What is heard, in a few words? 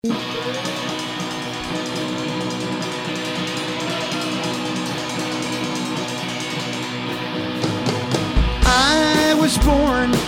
Contemporary Rock Band